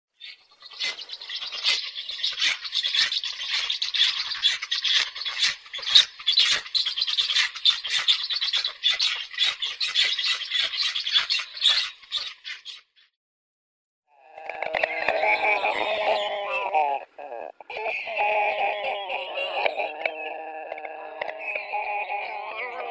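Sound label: bird voices, black stork, Ciconia nigra; aist chernii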